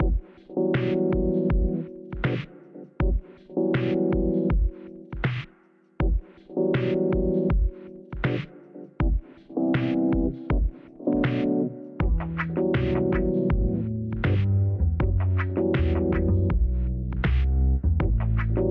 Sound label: Lobby; Theme Laika